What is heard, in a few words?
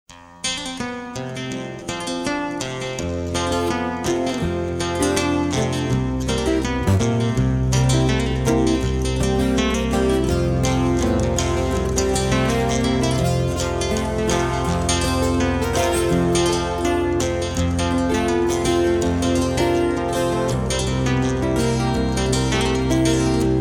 Slack Key